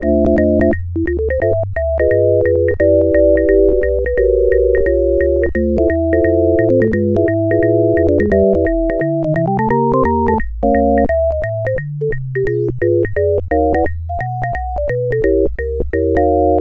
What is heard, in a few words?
Music Name entry Loop; gamerip; Heavy Burger